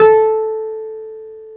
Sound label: PNO, Wurly